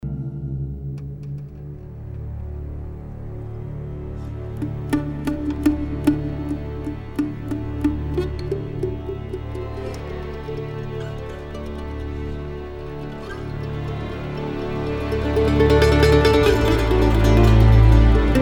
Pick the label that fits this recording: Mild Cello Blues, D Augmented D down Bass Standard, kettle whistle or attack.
Mild Cello Blues